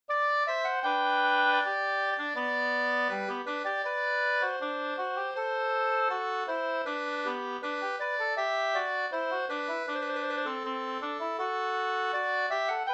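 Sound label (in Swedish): brudmarsch Gotlands brudmarsch 2 stämmor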